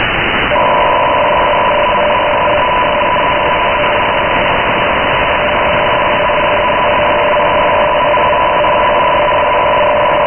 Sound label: 9200khz, tones